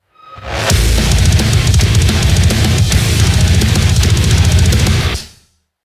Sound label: heavy; Doom